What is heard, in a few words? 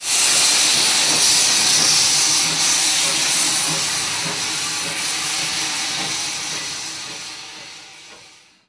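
factory noisy close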